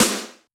Yamaha DJX 2B, SD Elec H

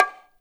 bongo; InVision Interactive; Percussion Slam; MACHINE

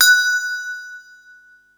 mallets

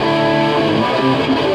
Krush, Electric Guitar

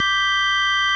ORG VOX, Continental; Roland LCDP09 Keys of the 60s and 70s 1

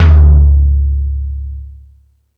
Thundadome, E-MU Pro-Cussion; drum machine

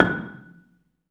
BELA F#5, BELAFON, Asian Traditions; USB Soundscan vol.60; AKAI

